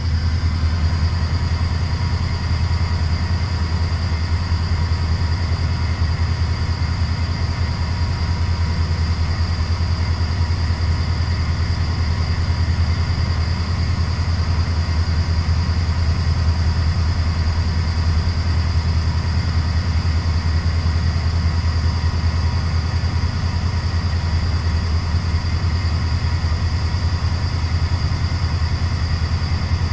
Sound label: Ferry Ship Engine Gear Down